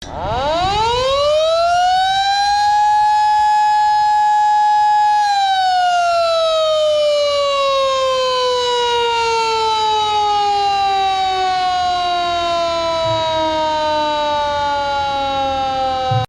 Som, sirene alta potencia incendio; EG-102; eletromecanica; Engesig Sinalização e Veículos Especiais

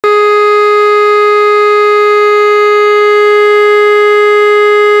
CONTINUO, sirene; sirene orbe

